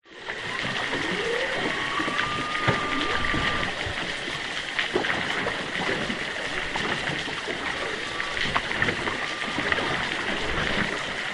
whales humpback underwater distant east australia choppy water; water movement; zapsplat